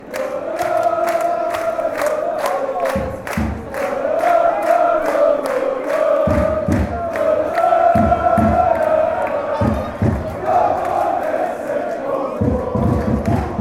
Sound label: fanchants; racing; come met klapt